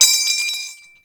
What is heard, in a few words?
Bayonet Clip Drop; Warframe; Tenno